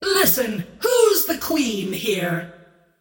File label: scream whiff; queenofpain